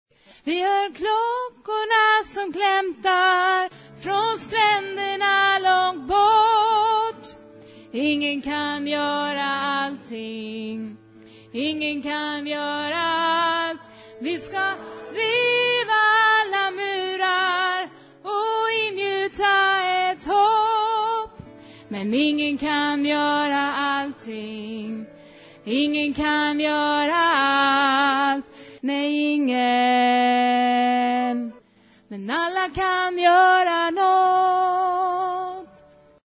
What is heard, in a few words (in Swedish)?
sopran